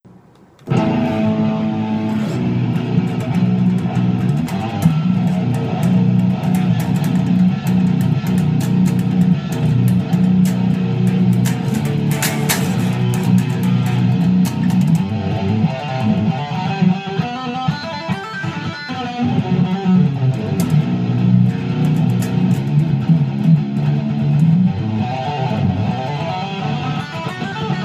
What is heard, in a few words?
7 String